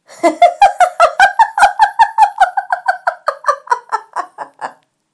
laugh hysteria

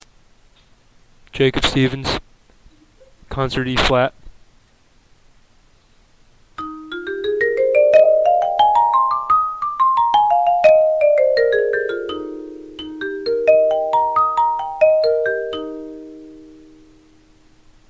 concert eb

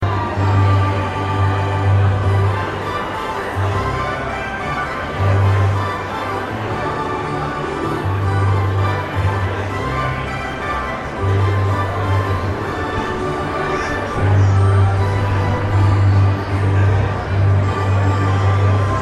at Mets v Marlins Citi Field; new york

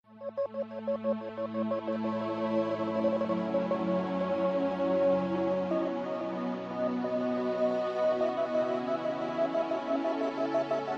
train station violinist live

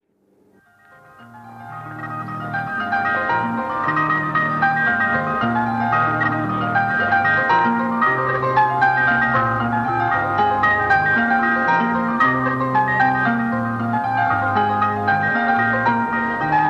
Celtic harp on the street, Galway

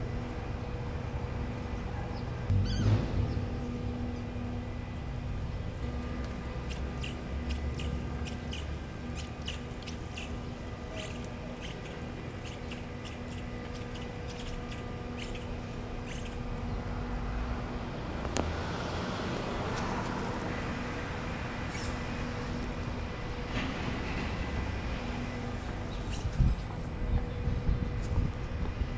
dogs with squeaky toy

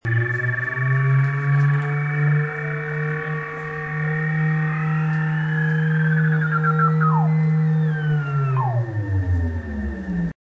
beep

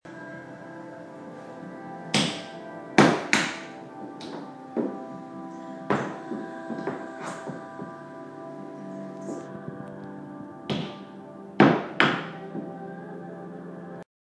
trying to remove nails

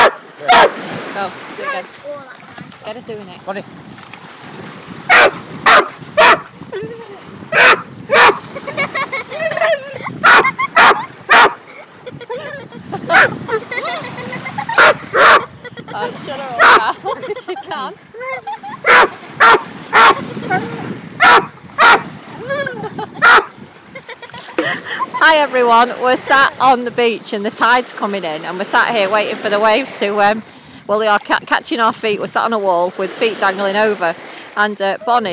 barking

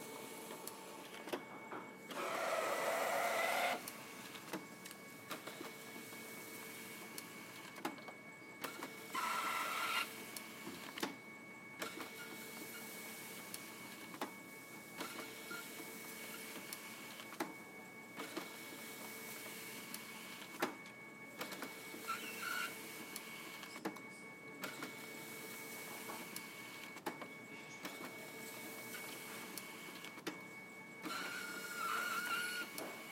scanner hp cz 5000

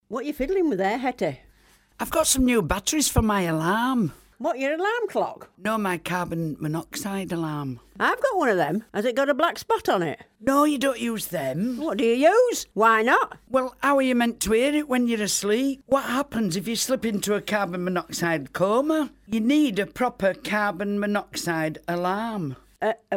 carbon monoxide jingle

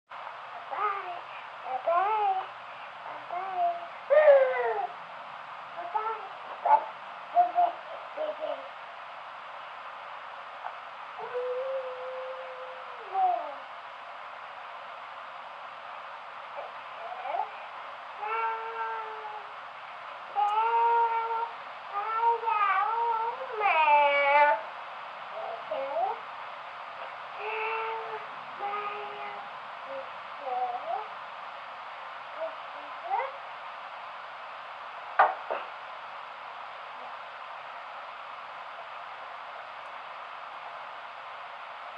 happy babbling post nap

